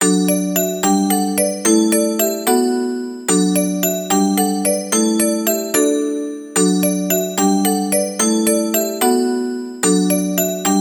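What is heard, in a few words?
incoming call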